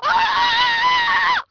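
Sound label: female scream; People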